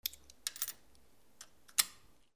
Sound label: MONEY